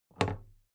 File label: big plastic case handle bounce regular